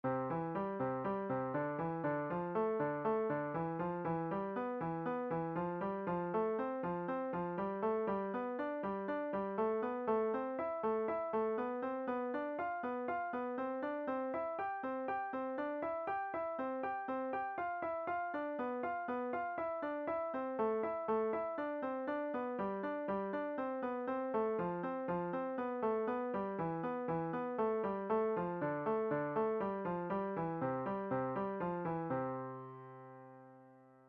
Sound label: Five finger Intervals Fifth, piano